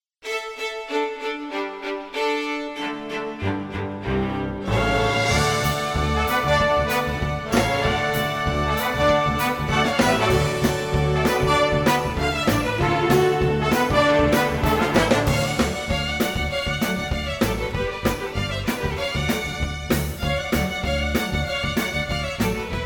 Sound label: hoedown